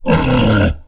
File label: hurt, aliens; ortnok